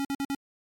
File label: Error